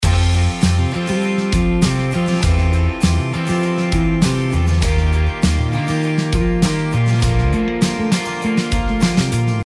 kick attack sustain

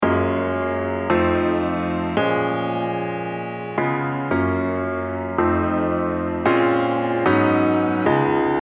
Piano

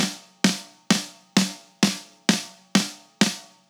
Snare

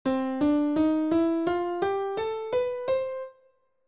Bluenote

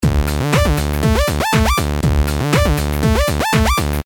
glide on

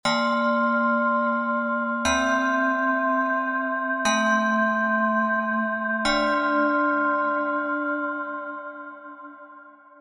Pigments 3 Bell

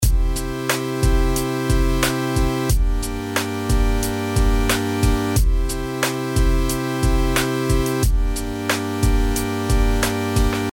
attack plus

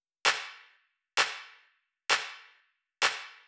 clap

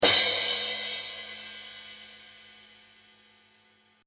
cymbal

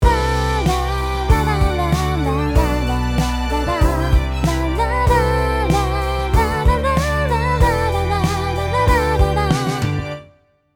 Strings Counter

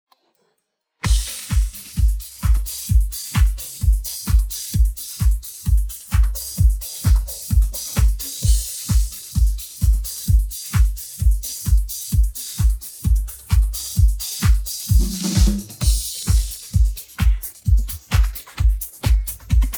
drum; lalalai

